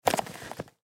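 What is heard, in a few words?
Tennis Bag Ball, Tennis Bag Place Tennis Ball Inside; SPORTS; Tennis (Sound Effects:Sports); Blastwave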